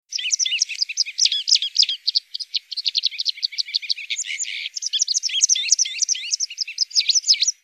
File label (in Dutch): vogel